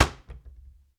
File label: DOOR Cupboard Wood Slam; mono; Universal Sound FX; DOORS & GATES